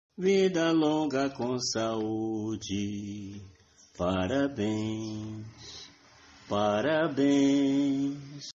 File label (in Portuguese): voz violao